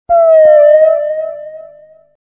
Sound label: whale